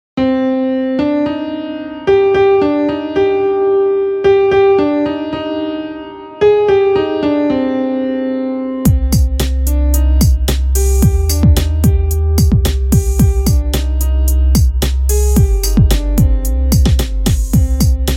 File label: instrument